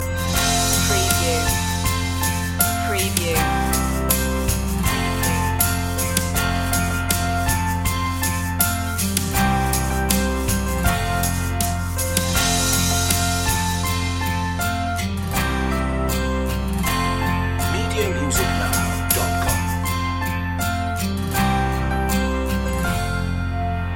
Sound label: the wheels on the bus loop